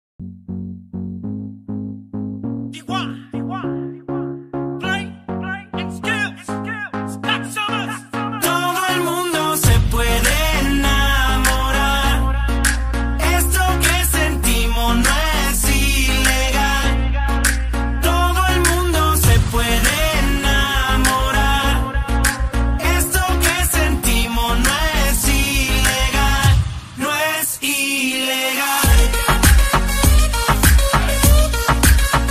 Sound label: reggaeton mix; reggaeton mix evolution